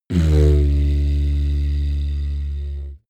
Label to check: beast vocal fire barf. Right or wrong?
Wrong